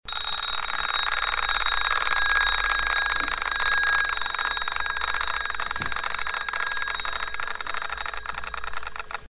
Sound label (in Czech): mechanicky budik